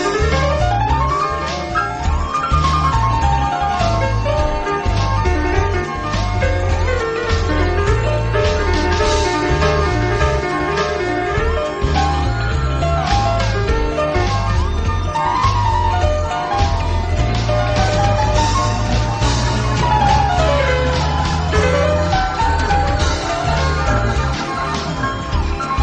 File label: JAZZY